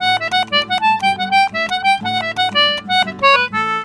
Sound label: D C3, Celtic Flavours; USB Soundscan vol.42; AKAI